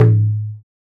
Perc Bass Pluck, One Shots, Drums, Misc Percussion